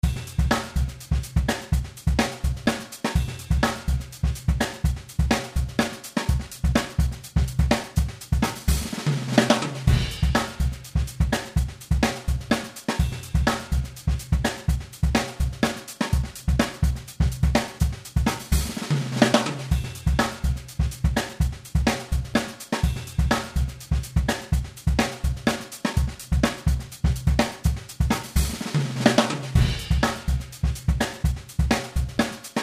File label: bassius odd time